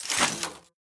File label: cover unhide